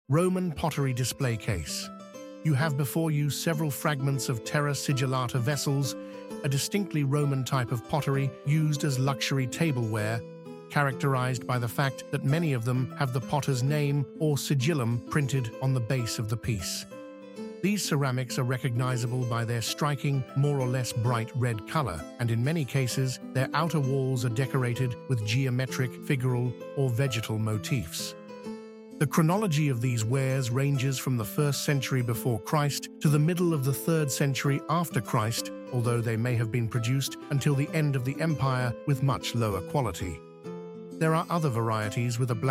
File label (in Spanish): música; archaeological museum audio guide